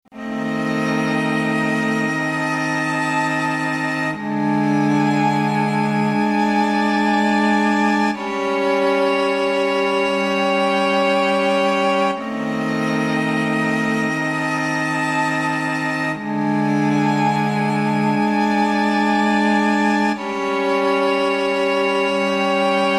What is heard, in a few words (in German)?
streicher